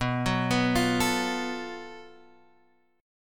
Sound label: Gb Minor Major 7th Double Flat 5th B